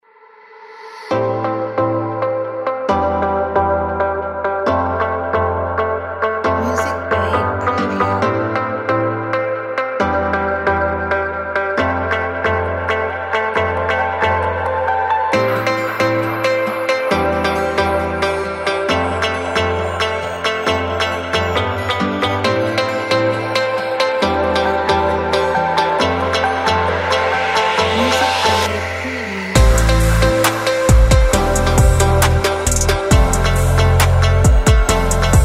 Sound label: Calm Waves